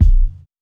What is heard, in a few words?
tight lows kick sample B key